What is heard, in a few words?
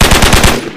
MP5 SMG, tono di notifica mitragliatrice; GunGuru